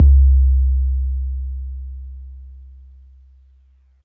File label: BD 808 MPC60